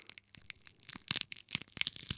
crackles, Cartoon Film Effects

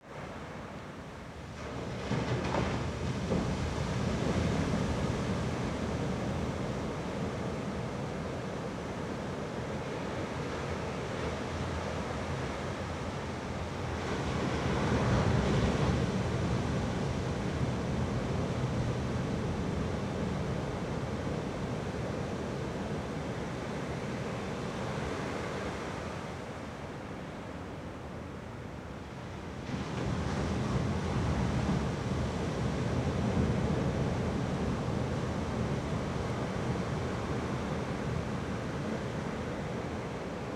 Ocean Waves Night From Balcony; Fairlight Sound Lib

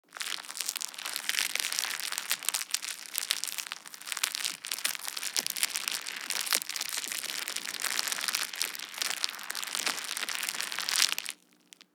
Foley Plastic; Loops; Ghosthack - Color Bass Essentials

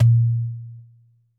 Doumbek - A#; percussion; Cymatics - Infinity Beta Pack 2.0; Zeus Percussion Collection

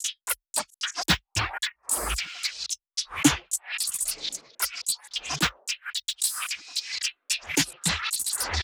Percussion Loop 111 BPM; Interest; Hip Hop

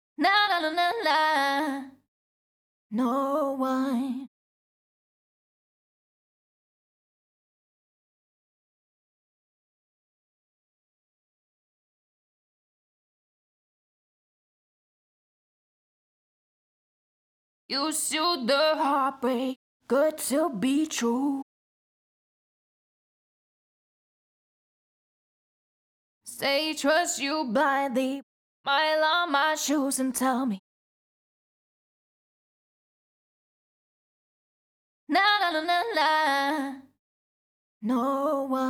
CLEAN HARM L (AbMaj), Pop Vocals; HEART BREAK; Acapellas

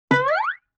Guitar Plink Slide

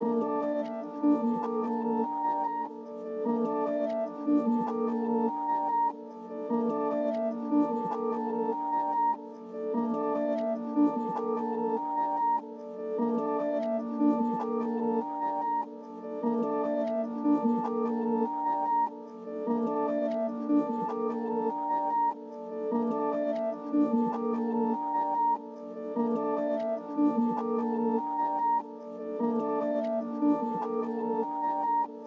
148 BPM D Min Reverse Piano; Loop Stems; Cymatics; Audemars

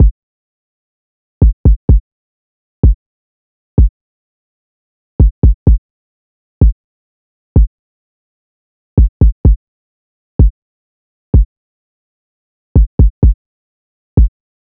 drum loop 127 BPM kick; Loop Stems; Cymatics; DOPE DRUMS VOL. 1; Grand Slam Drum Loop

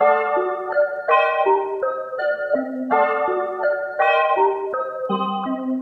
Various Melody Loop 165 BPM D Min; Cymatics; Oracle Sample Pack

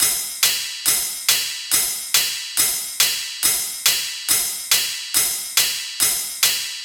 Cymbals 140BPM, dubstep; Loops; Drums; GhostHack; Dubstep Sounds 2025

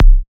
Kick C, psy kick; Drums; Ghosthack; Ghosthack Advent Calendar 2017 Day 9 - Psy Kicks; Ghosthack 20 Free Psy Kick

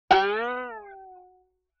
Boing Box High; Vintage Cartoon; Hits & Tones

